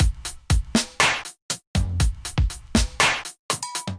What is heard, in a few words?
Kit, Drums, Hybrid; Klyde Kit; Ableton; Factory Packs; Drum Essentials